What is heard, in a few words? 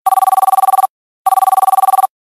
Double FBI Tone